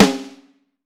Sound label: RS, Vintage Snare Drum; Q Up Arts; Johnny.C's Vintage Snare Drum